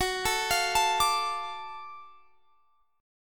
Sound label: Gb Minor Major 7th Sharp 5th Gb down Guitar Standard; arpeggiated; JGuitar